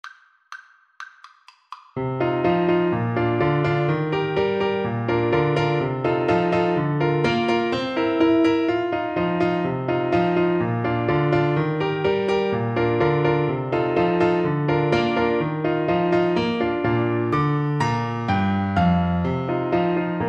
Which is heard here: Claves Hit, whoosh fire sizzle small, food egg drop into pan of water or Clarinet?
Clarinet